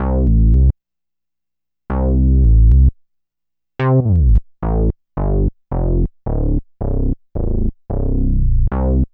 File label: BASS LP